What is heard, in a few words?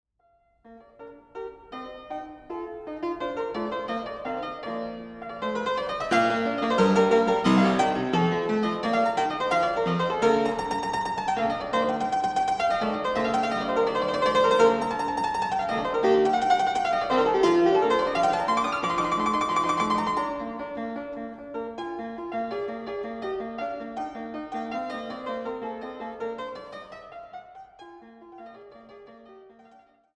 Piano Sonata in B flat; Robert Levin; Wolfgang Amadeus Mozart; The Piano Sonatas; No 13; K3